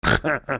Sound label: Kingpig Laugh; Bad Piggies